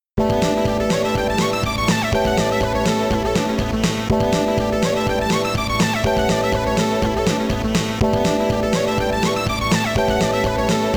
Multiball Restart Timer; Jack Bot; Williams pinball gamerip arcade

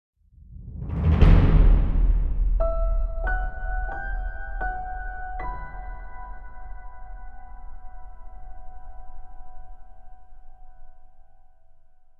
Opening Sting

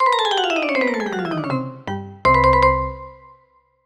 Jingles Lose

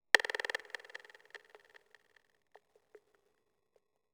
Oneshot Perc; zombie army 4 dead war ps4 stadia switch windows xbox one gamerip 2020

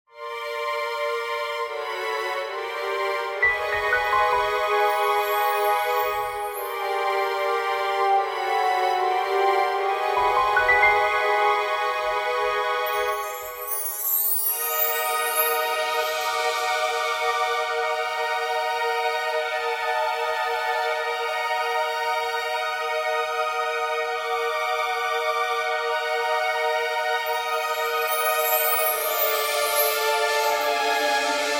Tense Mystery

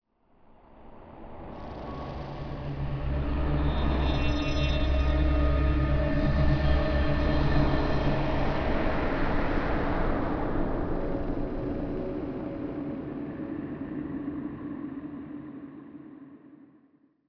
Night Music; undead overlord linux macos windows gamerip 2014

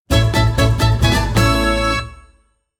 Jingle Success; Pokemon Masters EX

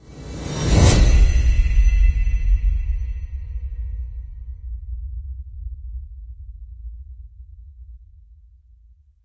Build up; friday the 13th killer puzzle

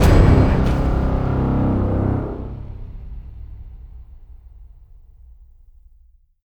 Fail

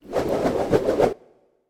Charge Attack Start; Lola

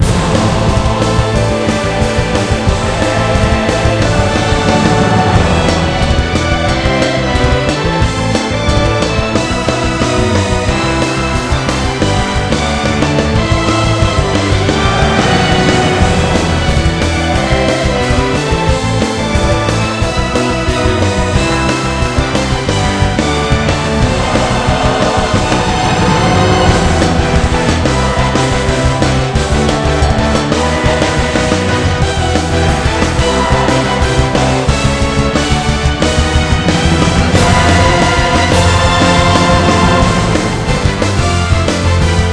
Score Attack Battle Loop

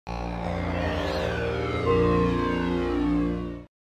Logo (Sony PlayStation Version); Namco

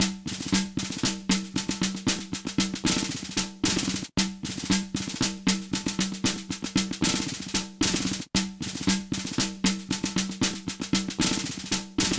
Aztec Marching Small; Black & White 2

